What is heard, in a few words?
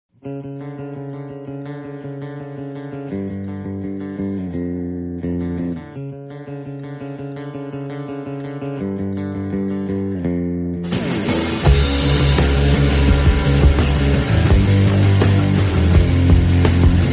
Instrumental Bgm; Varisu